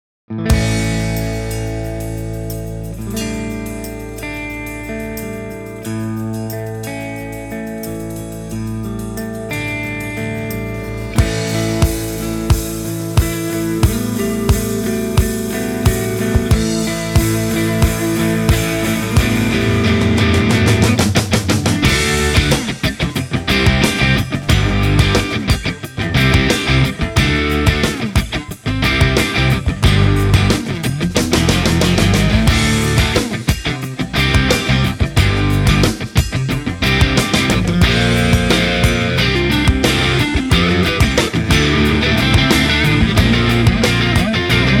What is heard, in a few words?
Pop Rocker